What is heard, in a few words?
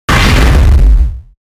Hit Thud Reverb; 5.1 STEREO MIX; Blastwave